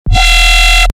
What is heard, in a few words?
BASS SHOT Waaaaa